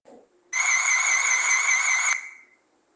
sonica; 27C10 NOBREAK; sirene alta potencia incendio

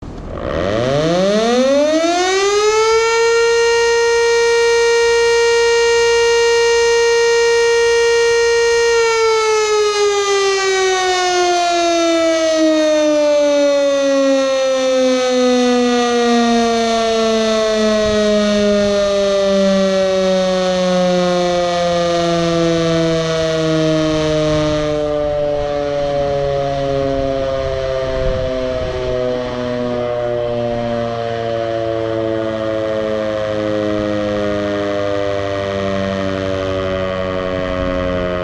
TT Sons, sirene eletromecanica, incendio; EG-1O0 TT; Engesig Sinalização e Veículos Especiais

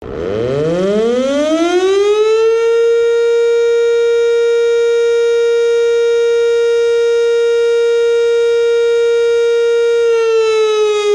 sirene eletromecanica; EG-109 T; Engesig Sinalização e Veículos Especiais; incendio

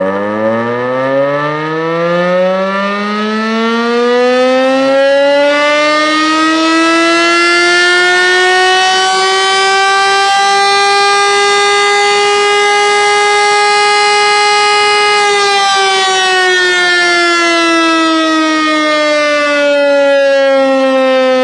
Som, sirene; RT28TT

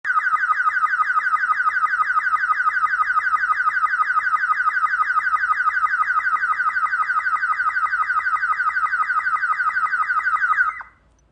sirene alta potencia

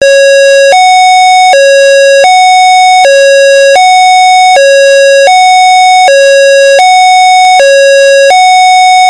HI LOW ( 650-750Hz AMBULANCIA), sirene; REBT 27C20

